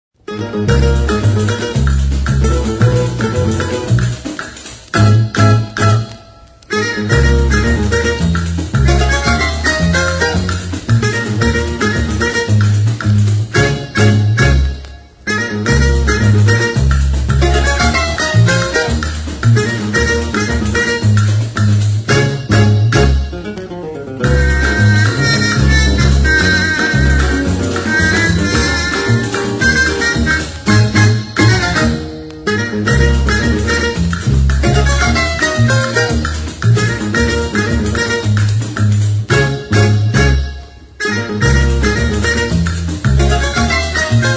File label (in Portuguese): Samba, Chorinhos